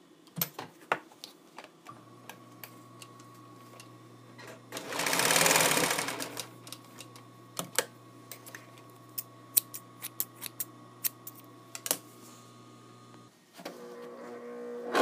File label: Sew